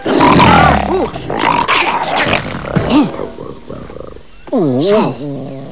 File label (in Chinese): 老虎; 动物; 兽类; 特酷声音素材库